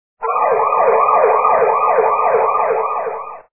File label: ambulance